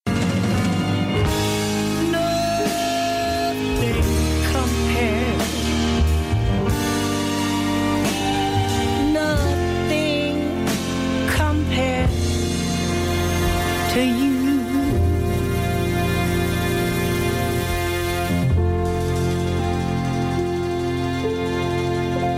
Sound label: jazz